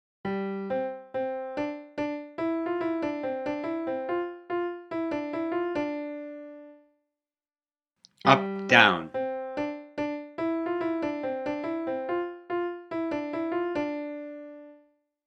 Upbeat Downbeat

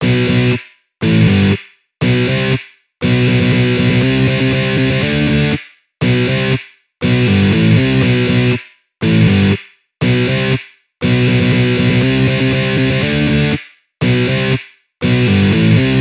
Rests example

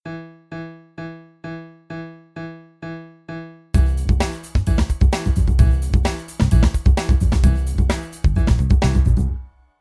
Allegro

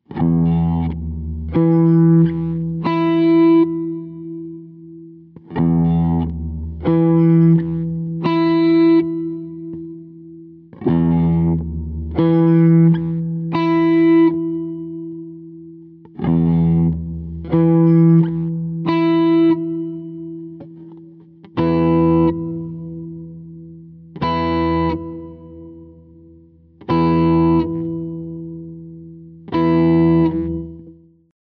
guitar

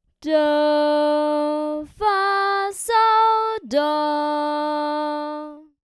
song progression

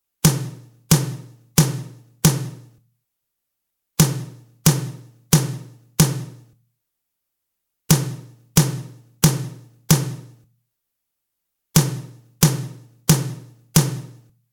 High Tom 16k boost

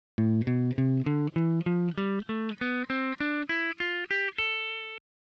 minor pentatonic

